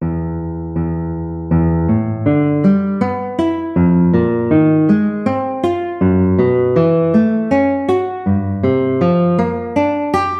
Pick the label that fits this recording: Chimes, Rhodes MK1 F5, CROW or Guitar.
Guitar